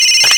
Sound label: phone